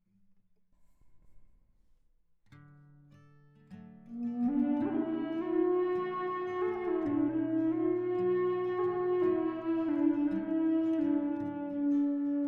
B moll tief Eastern Red Cedar Flöte Gitarre

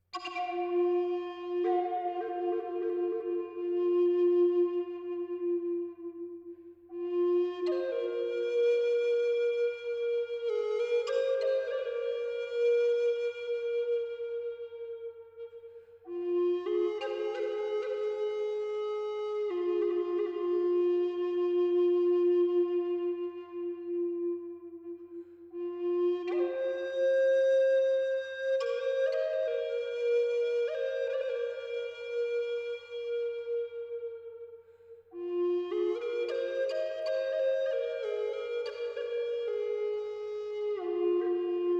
Haselnussast Fis tief 432Hz

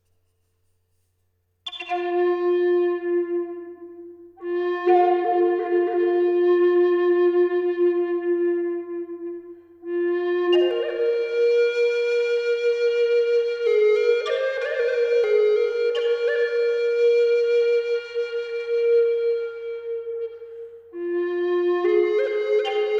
Flötenmodel Ahorn Fis tief 432 Hz

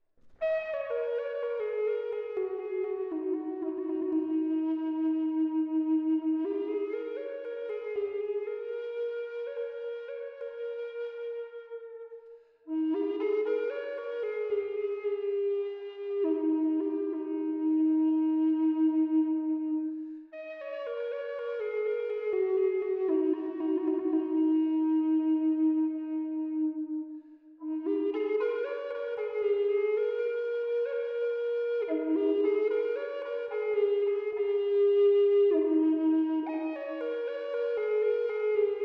Haselnuß E 432 Hz Ast

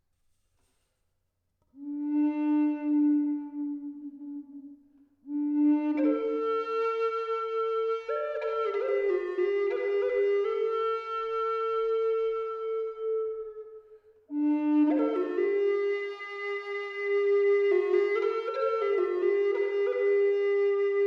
Weißtanne Dis tief 432 Hz

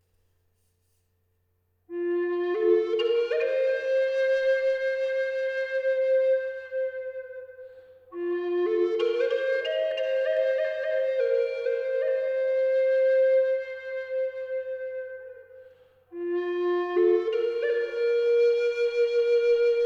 Flötenmodel Ahorn Fis tief 432 Hz

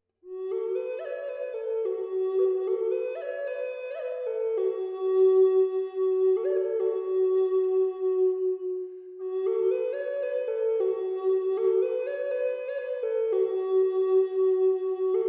Feldahorn G 432Hz Ast

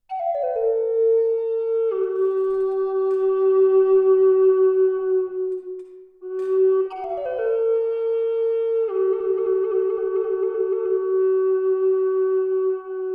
Olive G 432Hz

